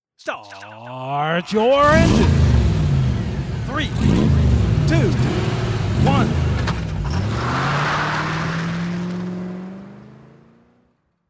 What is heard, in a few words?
COUNTOFF